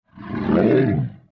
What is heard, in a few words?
space dragon roar